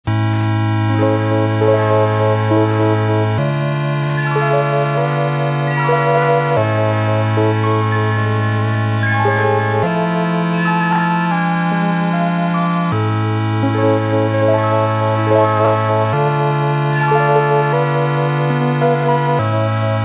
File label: Omnichord